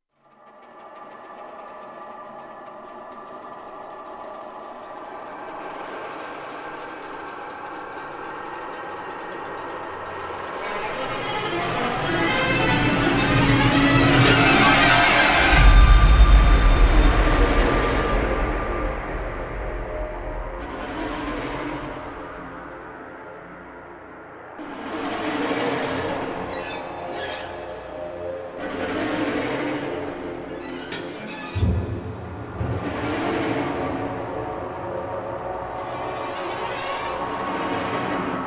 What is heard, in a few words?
horror trailer music, epic atmospheric horror sound